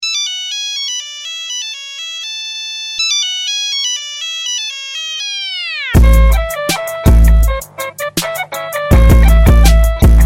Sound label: nokia type beat